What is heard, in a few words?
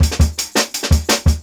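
Jungle Breaks, 168 bpm; Original Jungle Breaks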